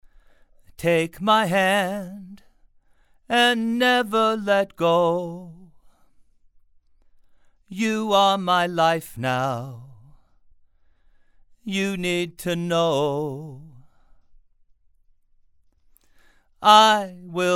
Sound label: Male Vocals